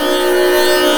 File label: tampura; SDIF; ICMC2000